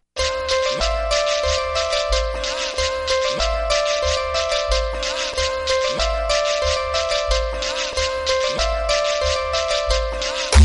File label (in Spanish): guaracha